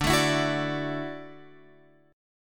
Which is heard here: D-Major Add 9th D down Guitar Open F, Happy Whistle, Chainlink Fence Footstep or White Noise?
D-Major Add 9th D down Guitar Open F